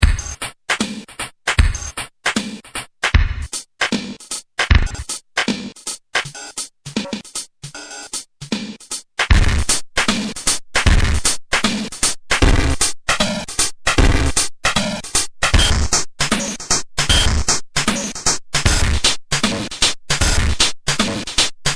dub

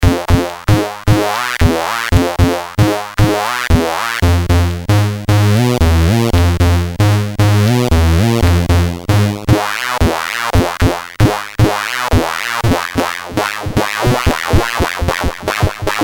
c64 random

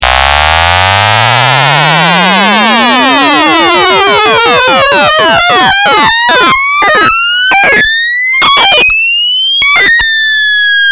acid FM tones

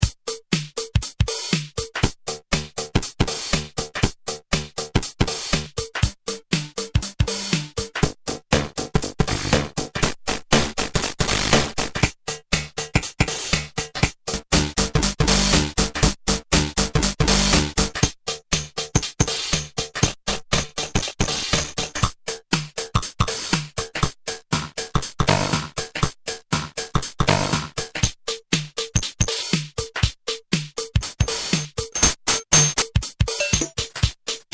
break, drum

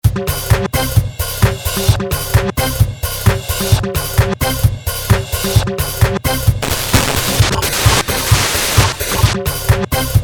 rx8 demo, drum

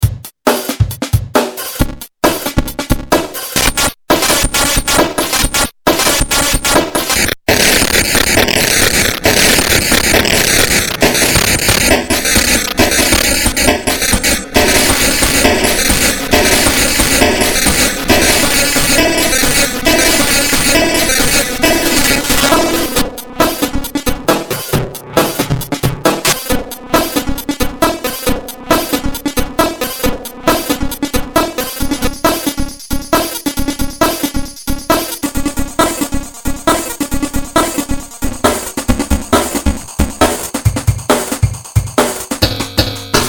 rx8 demo, drum

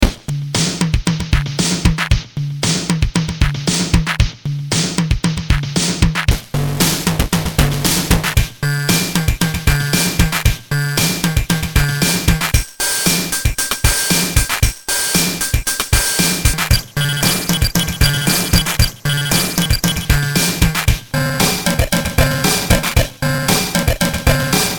slow break

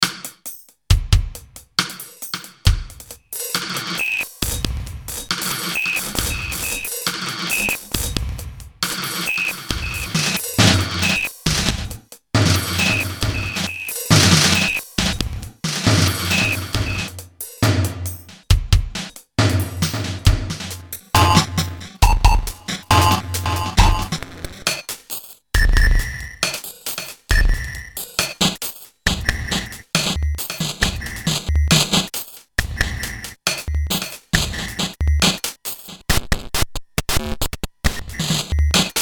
SR16

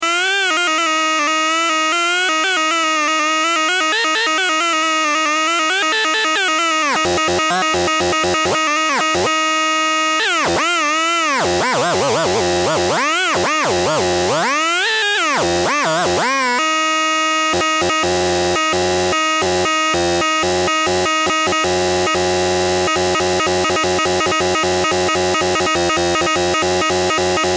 tone

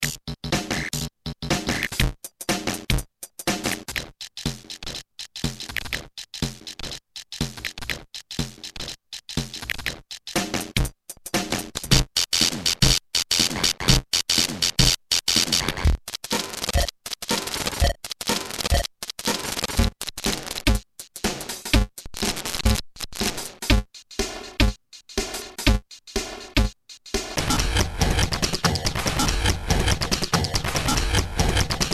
xr10, drum

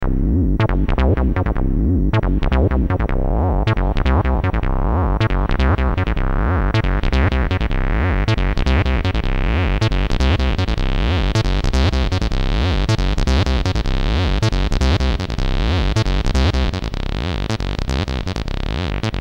c64 acid squelch

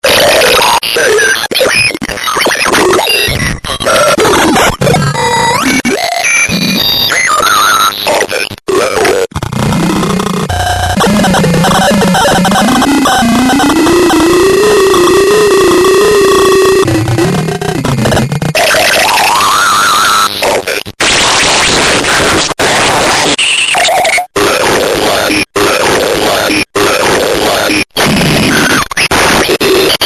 evil

